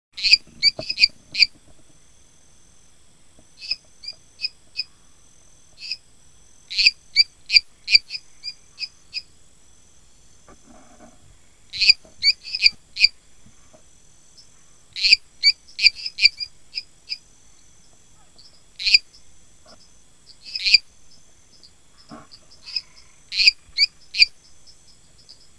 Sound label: Dendropsophus minutus pererequinha-do-brejo